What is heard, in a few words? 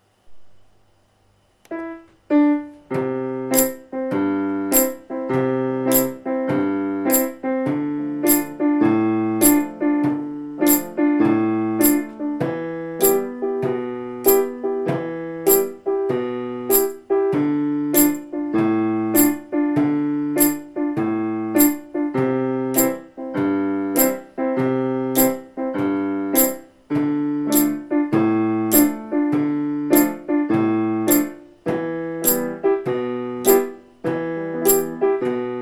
music slow